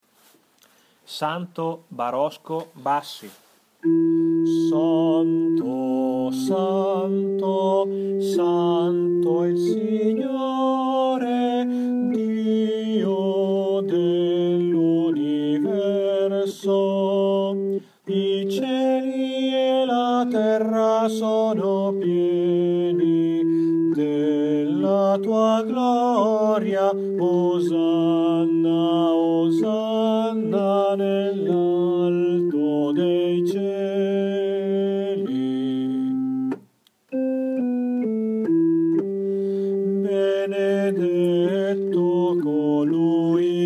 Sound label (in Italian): bassi; santo